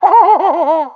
high five success

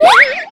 Banana slip

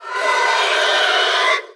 sapper scream; [длинный крик 2]

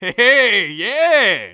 Scout taunt conga fun; Heh hey, yeah!

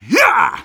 Sniper taunt exert

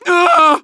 Medic pain severe

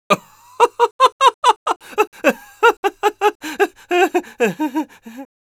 Scout laugh long, es; «...ay, tío»

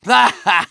laugh short; Medic

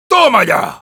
Sniper cheers, es; ¡Toma ya!

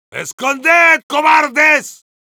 yell, es; Heavy

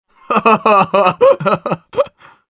laugh evil, ru; Scout

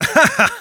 laugh short; Sniper

